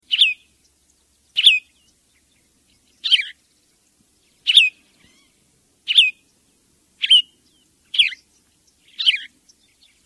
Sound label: Gray Vireo, songs